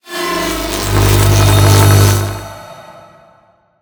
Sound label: Thunderwave